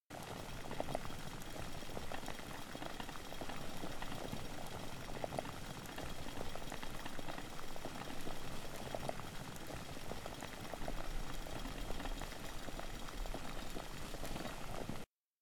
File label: pot simmer miso loop; anemoi